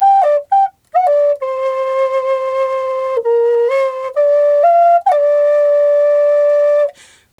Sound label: G 130, FLU RIFFS, Didgeridoo and Other Primitive Instruments; Big Fish Audio - Didgeridoo and Other Primitive Instruments